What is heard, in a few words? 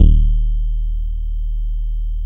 GLASSY D#1, BASSES; Best Service Alpha Dance vol.2